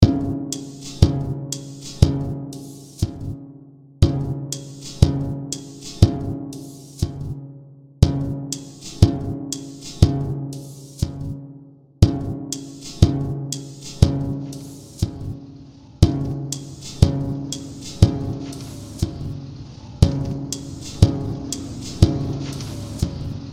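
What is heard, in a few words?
Machine Train With Beat; Machine Frm SF Train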